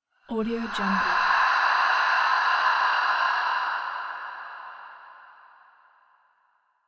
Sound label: female sigh exhale